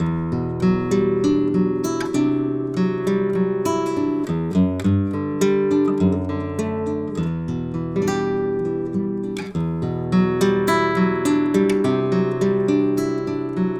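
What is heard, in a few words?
Acoustic Guitar Intercuts, KM84; KM84 Clone; mic madness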